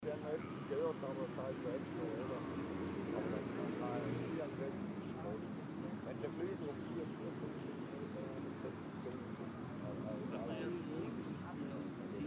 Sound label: atmo kantipark